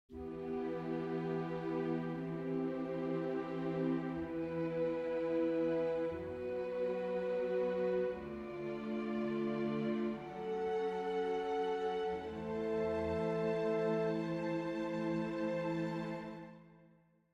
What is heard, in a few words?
Exercise Just Triads